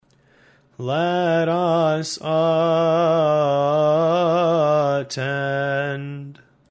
let us attend, hymns

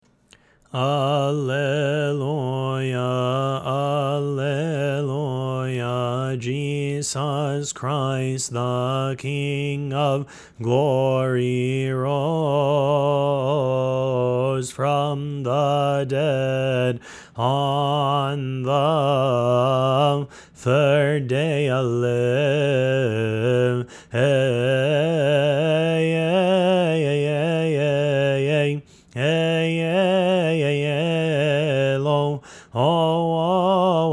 psalm response, hymns

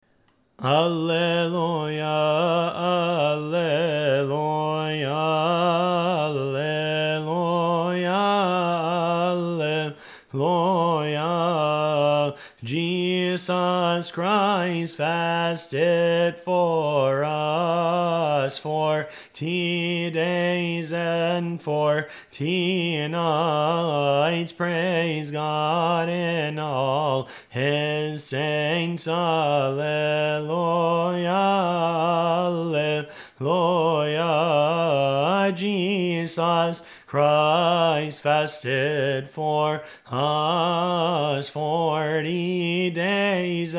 psalm 150 refrain, hymns

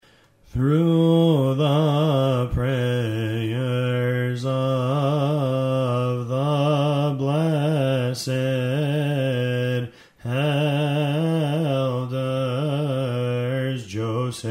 hymn of the intercessions

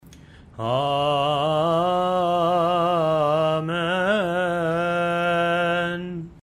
offertory amen, hymns

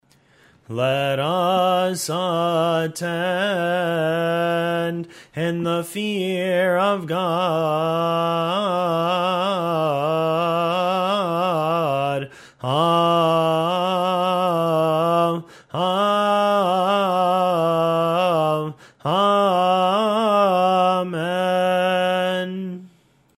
saved amen and with your spirit

